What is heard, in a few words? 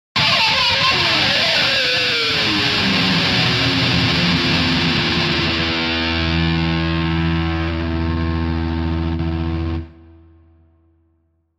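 Electric Guitar Plectrum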